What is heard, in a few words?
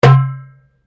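Drum Darabuka Strike forte, Egyptian Darabuka Drum; Commanly available Egyptian Darabuka Drum; Musical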